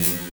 HH, Drum & Bass, 160 BPM

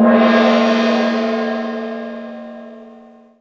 F3 GONG; Greytsounds VOL-1; SDX PERC

